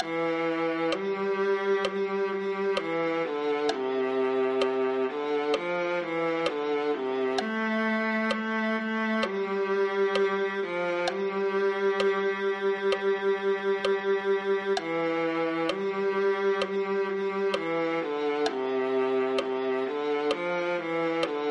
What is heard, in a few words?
viola